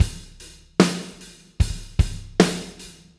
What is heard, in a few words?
Beat Loops, 075; Zero-G; Monster Beats